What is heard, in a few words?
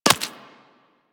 Bullet Impact Flesh; Warframe; Weapons